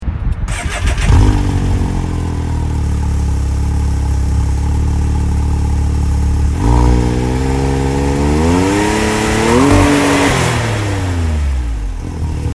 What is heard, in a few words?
Stock X3 RC; Can-Am Maverick X3 Models; Force Turbos Back Exhaust For Can-Am Maverick X3 Models